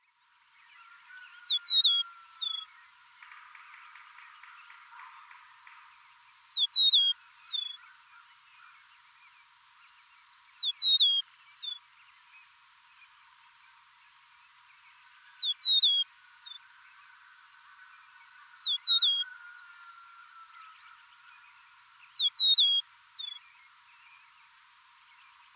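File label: Eastern Meadowlark Songs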